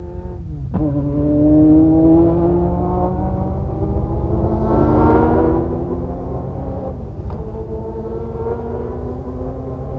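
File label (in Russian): звук начавшейся гонки слышен издалека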